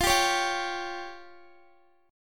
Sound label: F Suspended 2nd Sharp 5th F down Guitar; Fsus2#5; strummed